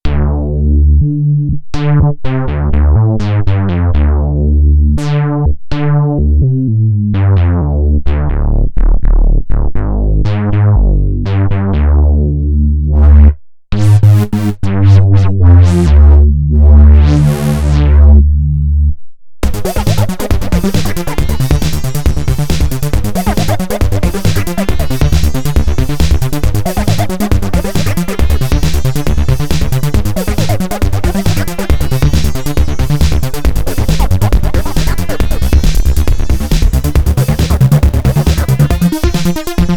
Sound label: Krash Bass, Korg Radias-R